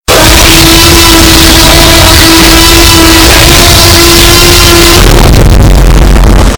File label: t-rex roar